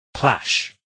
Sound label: plash